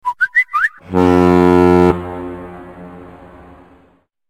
Whistle Horn

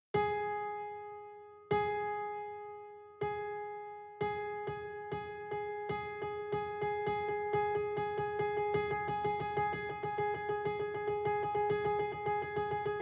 Blast beat

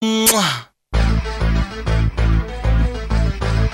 Kissing; Kissing Message

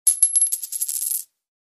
New Sms

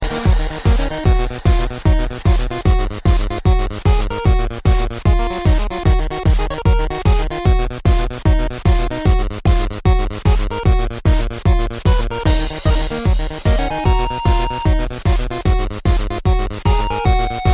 Hardstyle

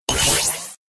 Laser

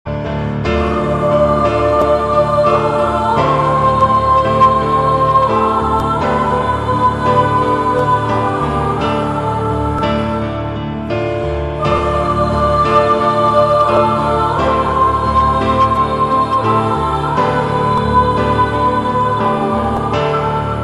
Angel Melody

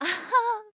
human cheer

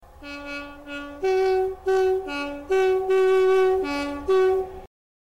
Deltic horn; BR

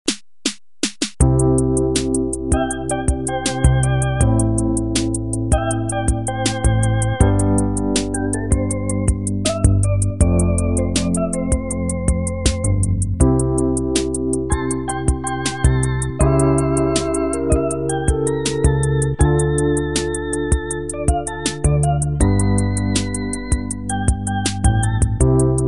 groove

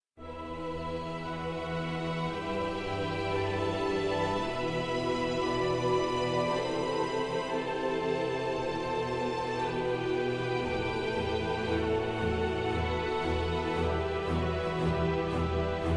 March Of Honor; KOH

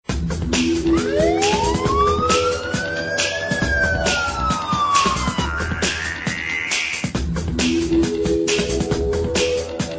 Techno Police

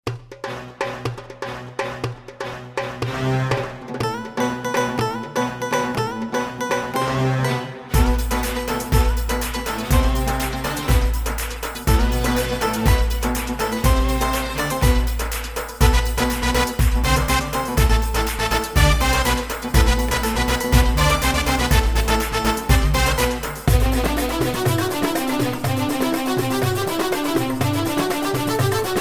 Balkan Sound

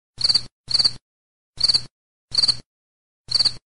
Cricket Chirp

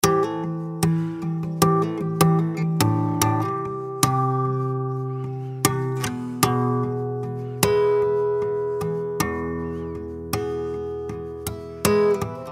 Acoustic Guitar

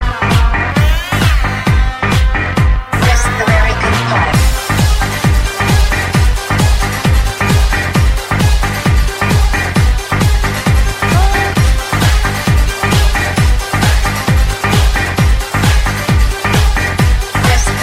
Dj Music

